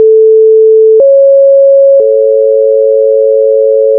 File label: 5 harmonic